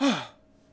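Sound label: Longbowman Heal Vox; Civ4 warlords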